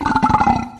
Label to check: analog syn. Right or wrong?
Wrong